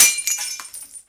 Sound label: slapstick Glass Break; Cavemanon; SnootGame